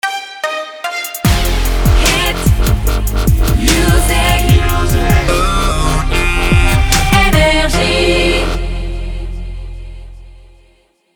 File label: Jingle Shotgun; NRJ